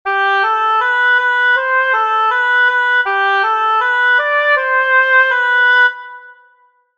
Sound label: Gavotte phrase a seule